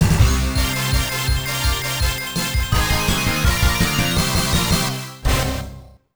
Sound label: Victory BGM; premier soccer arcade gamerip 1993; E splendido!